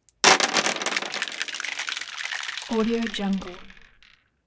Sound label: beads